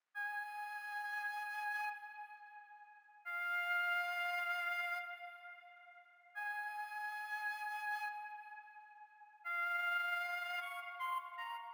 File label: Nostalgic Melody Loop - 155 BPM F Min Flute; Melodies; Loop Stems; Cymatics; Oracle Sample Pack